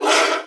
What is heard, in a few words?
sapper snap